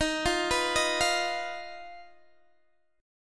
D sharp Suspended 2nd Sharp 5th D sharp, Guitar Open D; chordshape